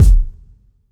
large room kick drum E key; kick drum samples

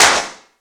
reverb snare a sharp key

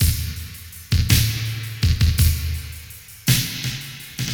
110 bpm 80s breakbeat d sharp key

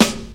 90s original hip hop steel snare drum sound a key

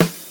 natural steel snare drum sample E key

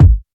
clear steel kick drum sample g sharp key

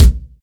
rich top end bass drum sample a key; kick drum samples

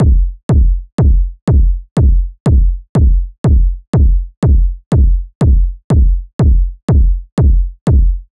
percussion synth massive kick zone; 122 bpm

